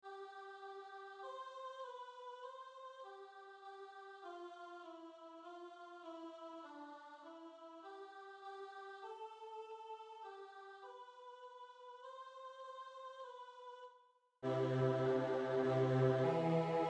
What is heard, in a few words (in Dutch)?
bas, agnus dei